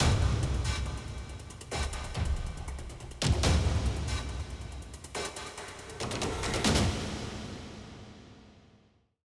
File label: SONG DAMAGE LOOP; KONTAKT LIBRARY